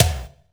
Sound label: Bd Reso, Kick Drums; Zero-G; Soundclash